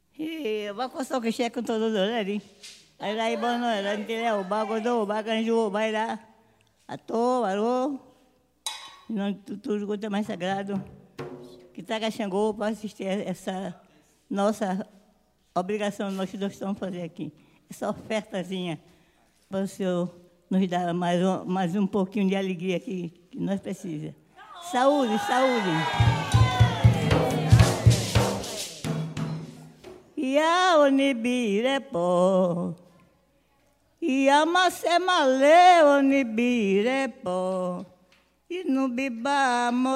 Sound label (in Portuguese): toada para xango